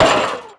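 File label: MACHIN 100 BPM; MACHINES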